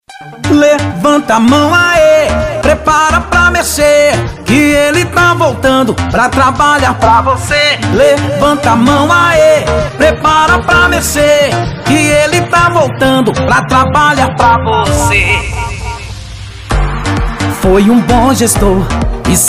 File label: Jingle